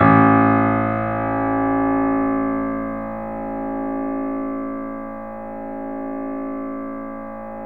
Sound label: A, The Piano; InVision Interactive - The Piano